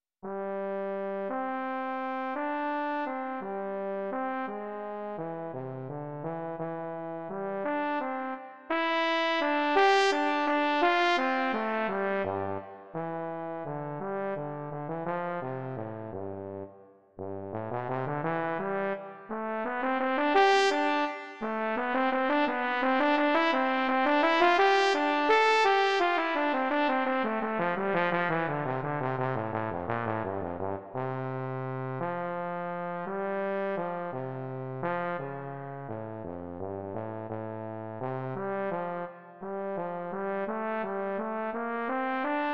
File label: for trombone quasi valzer